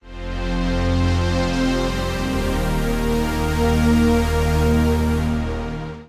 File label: Pad (F) 118bpm; Music Loops; Gracious Fields; Ableton; Singularities